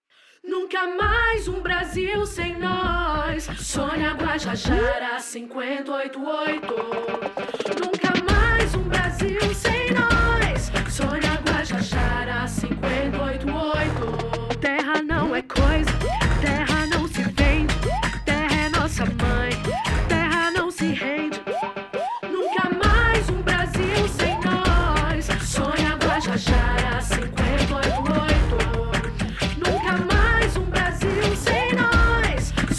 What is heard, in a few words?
Jingle Funk Mix